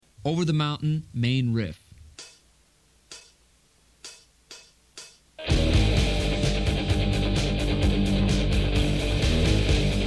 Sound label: main riff